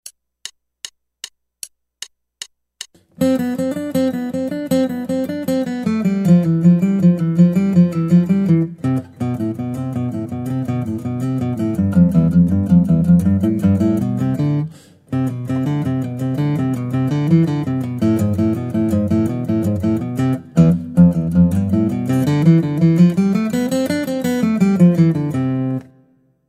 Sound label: Guitar